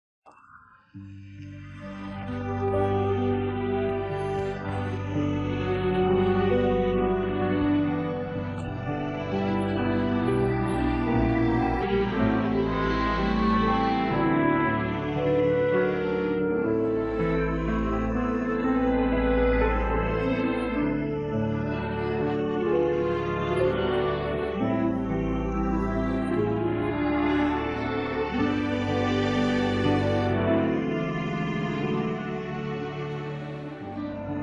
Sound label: accomp